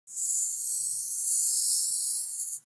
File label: office marker underline; foley; smartsoundfx